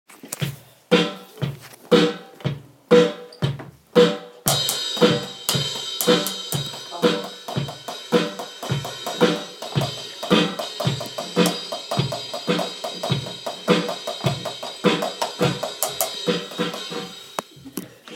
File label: live drum recording